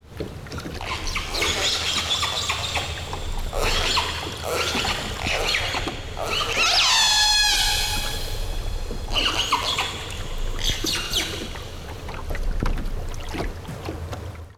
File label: killer resident Pod, dolphins